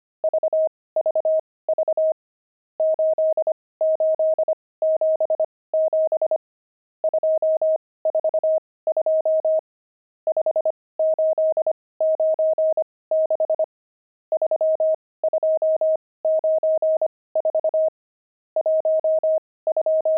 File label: pangram, intermediate pangrams files